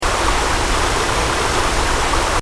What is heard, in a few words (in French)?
Riviere Ac Courant, Water; Water (Sound Effects:Nature)